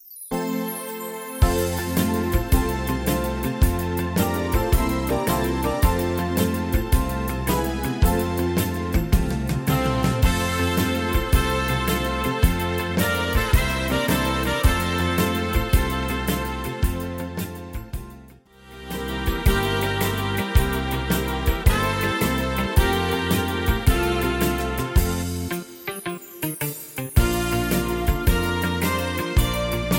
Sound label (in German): Gitarren klingen leise durch die Nacht